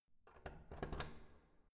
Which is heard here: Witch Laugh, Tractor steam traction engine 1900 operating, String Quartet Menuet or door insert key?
door insert key